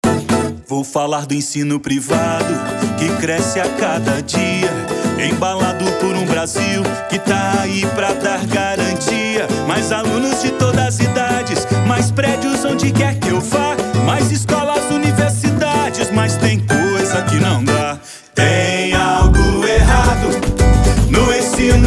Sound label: Jingle; Contee